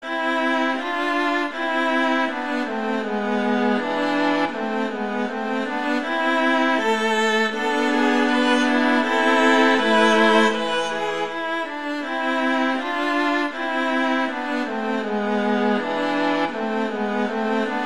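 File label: Duet, Romanza Andante, viola; Mazas; Duets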